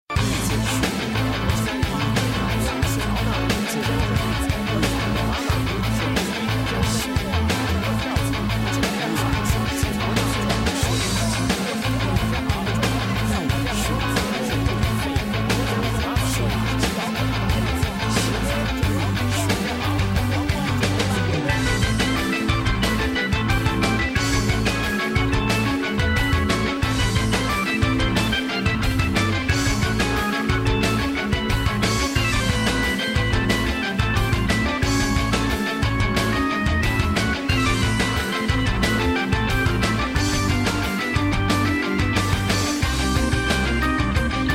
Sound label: instrumental; On the Box